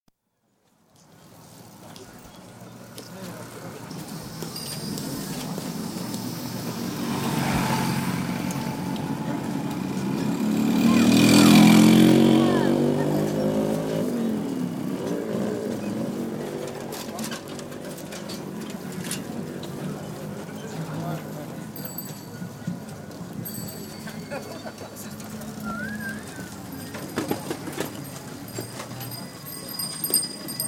Datong: Narrow street with crowds, bicycles & light traffic; Bicycle Sound Effects; BBC